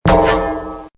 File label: TABLE; MOBICLIC161